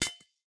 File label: Sugar Drop; MiSide